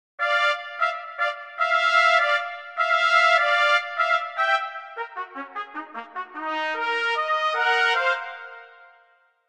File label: trumpet section; kontakt player; sibelius